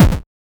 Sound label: enemy hit basic hit sound, pixel game